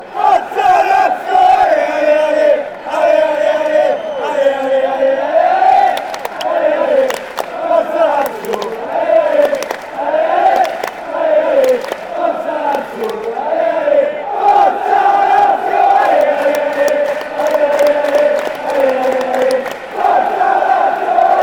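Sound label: go lazio come come, football chant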